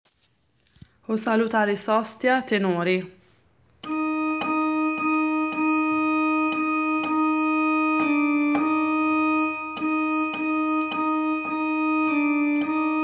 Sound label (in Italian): tenori; O salutaris hostia